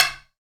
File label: Shu Gu Rim, Percussion; Roland BK-5